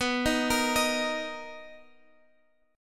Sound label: B Major 7th B down; arpeggiated